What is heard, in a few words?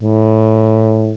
TUBA; BRASS1